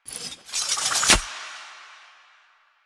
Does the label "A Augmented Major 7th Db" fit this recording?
No